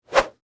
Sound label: Whip Whoosh Cord; Foley; Sound Effects:Objects